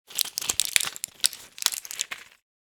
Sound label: Foil blister pack handling and crinkle; medicine